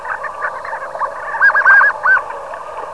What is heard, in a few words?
Histrionicus histrionicus